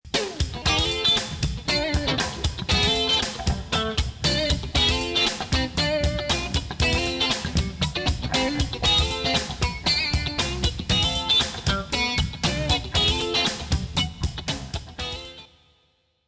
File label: funk